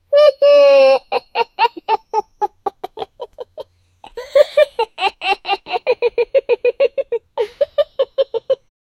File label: puppy laugh, pet